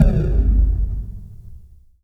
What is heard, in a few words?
8bit Hit; Jungle; FX; Blu Mar Ten - Jungle Jungle - 1989 to 1999 Samplepack